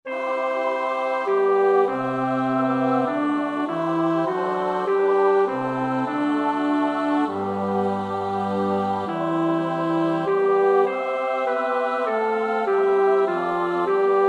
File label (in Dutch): bas, koorpartij oefening; Bach (Johann Sebastiaan); BWV 019; 7 Lass dein Engel mit mir fahren